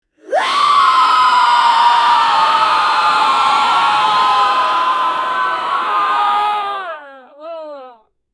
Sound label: studio audience erupts